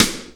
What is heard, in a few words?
sidekick, Snares Knocks